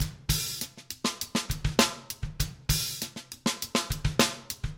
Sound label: Funk, Drum Loops